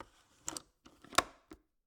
Cabin, window, latch, flip, lock, close, hyper; Eiravaein Works; Vaeyan IV

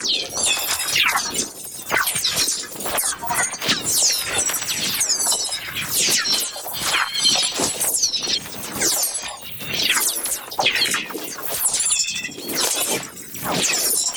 PARTICLES SMALL FAST Doppler Hailstorm; NEON; boom library

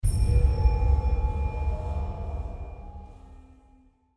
Altar; Blind Quest - The Frost Demon

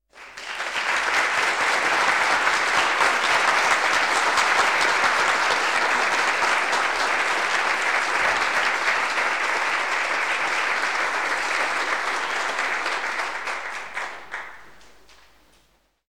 Applause 80 to 100 People; KMP Film Video Sound Effects

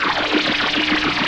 Teleport Beam Loop; SFX Kit; Sci-Fi Loops

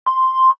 Sci Fi Beep; 1001 Sound Effects

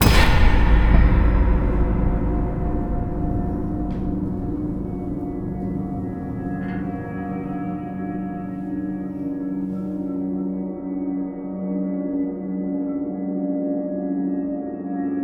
STING, Cinematic Metal Impacts; boom library

